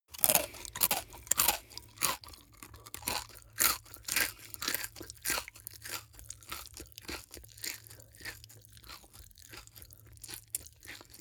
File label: eat crisp mouth open